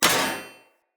Short Sword Thrown Impact Heavy Armour

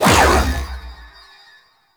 Whirlwind Cast Stop; Warframe; Caliban

